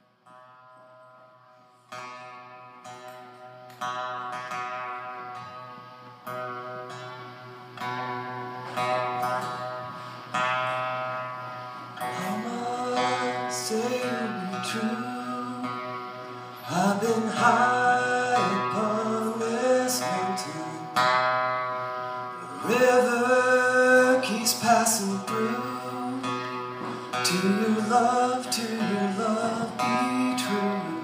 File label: Anthem Audience Recording; Alternate ROOTS